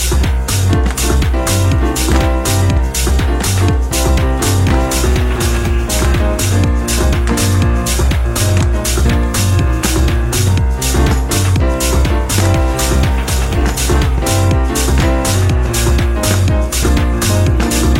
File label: Ringtone; Samsung Galaxy S21 Ultra 5G